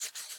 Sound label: Sketch Env Write; Maze